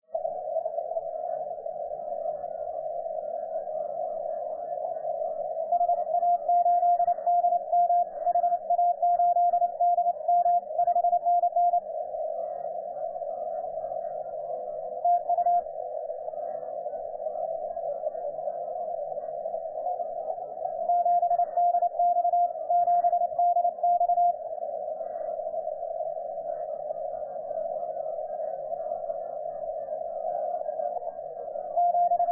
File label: CW